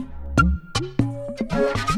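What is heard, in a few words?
Music Base 120; from dust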